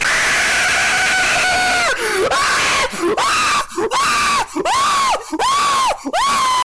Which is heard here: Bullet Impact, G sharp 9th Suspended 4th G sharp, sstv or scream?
scream